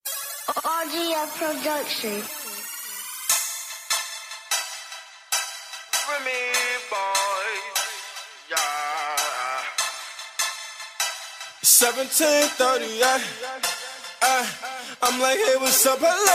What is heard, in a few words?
hip hop solo